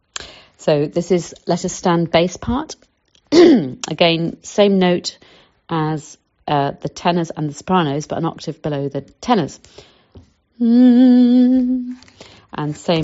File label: let us stand bass